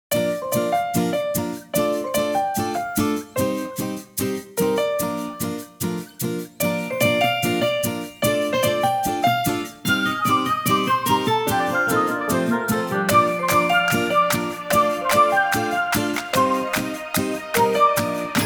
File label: Alarm; OnePlus; One China